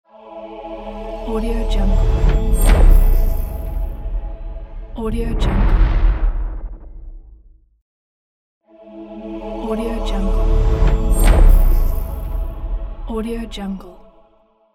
modern impact logo; modulationmusic

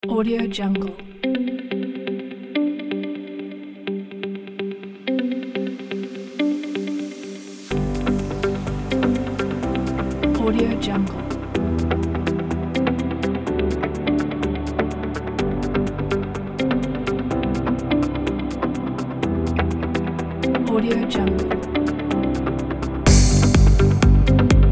corporate motivation kit

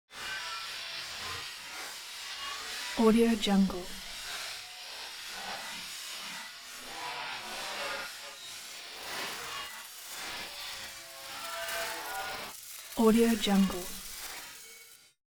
glass shard graphic constant tinny crashing

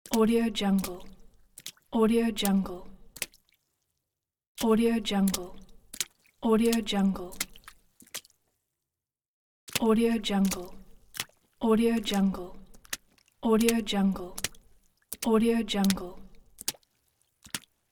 footsteps water

